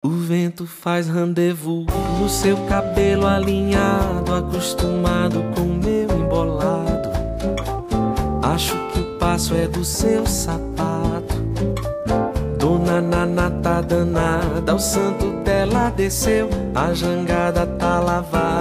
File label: Brazilian Cafe